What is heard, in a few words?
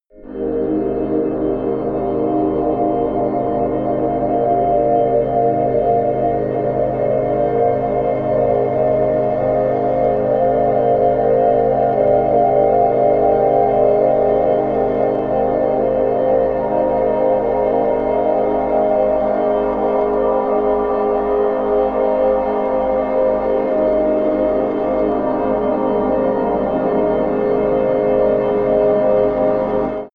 wavy clouds, music elements